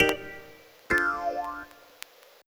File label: FK KEY, FUNK; USB Soundscan vol.47; AKAI